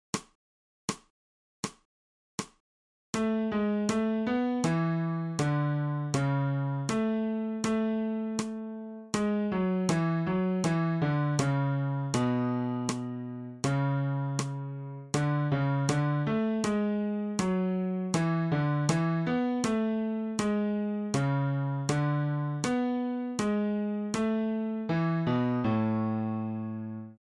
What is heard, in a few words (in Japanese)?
新フレットレス